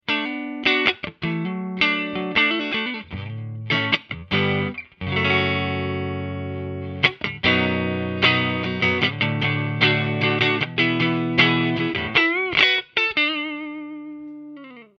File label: clean